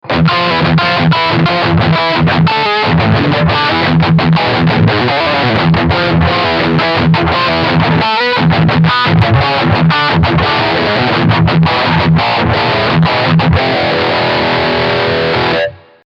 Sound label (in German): Riff Distortion Steg PU